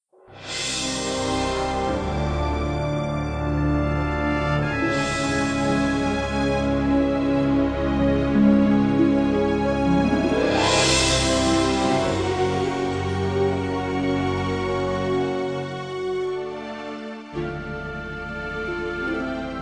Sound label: Music Idle; Zak Storm Super Pirate; mobile